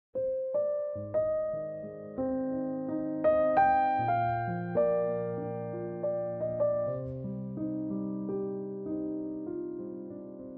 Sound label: Piano Layer